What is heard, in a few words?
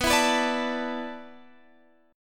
A Suspended 2nd Suspended 4th